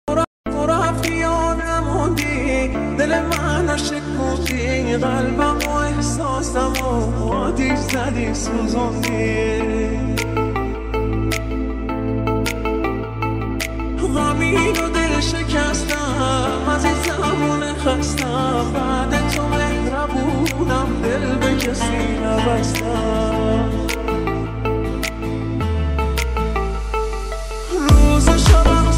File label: remix arabic music